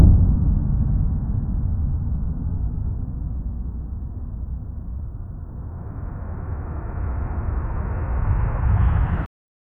military war sounds on a, battlefield

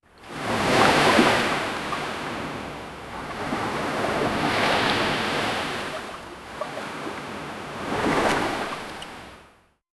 ambient ocean at night ca, whale calls

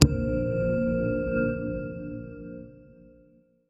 logo thud

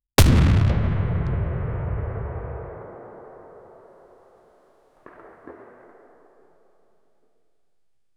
loud and realistic world, cannons

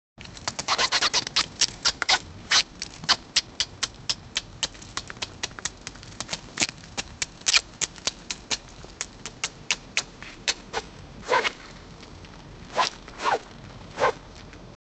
light rapid scratching; animal behavior

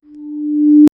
reveal of a card, cards

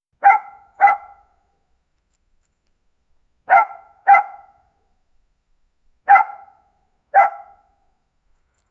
distant single dog bark

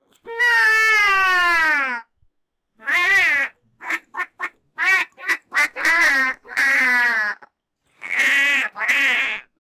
rooster, farmyard

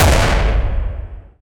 hard punch crater impact, body

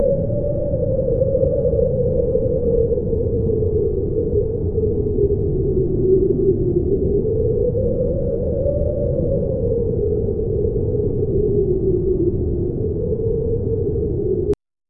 wind from mars planet, alien environment

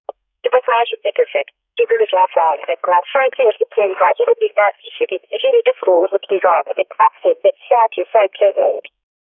old phone recorder answer, telephone

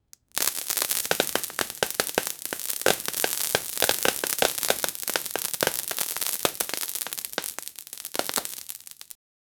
roast bursts into flames, burning

